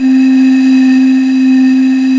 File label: BOTTLE BLOW, PIPE